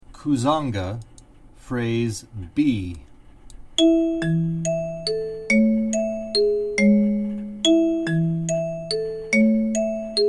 Kuzanga Prog B, kalimba

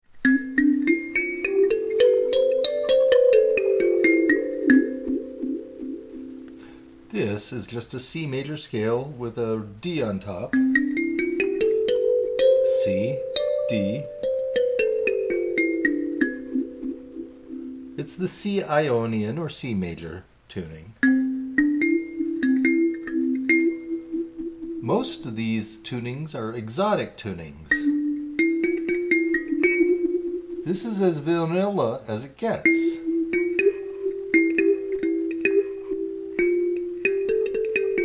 Olive Tuning, Olive-9 Kalimba in "A" Muse Tuning; Magadi Olive-9 Kalimba; This tuning uses a lydian scale

